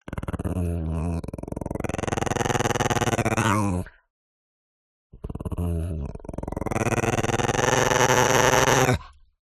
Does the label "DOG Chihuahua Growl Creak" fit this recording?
Yes